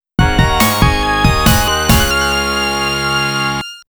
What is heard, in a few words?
GAME OVER; toaplan arcade sound digital collection vol.01